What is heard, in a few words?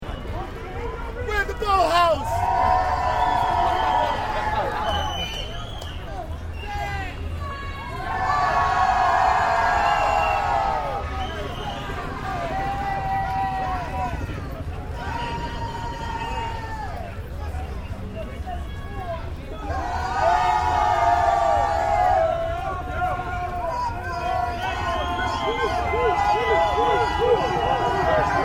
Demonstration Protest New York Long; Crowd in Central Park NYC shouting, marching and protesting. Note! Some bad lang; Crowd Sound Effects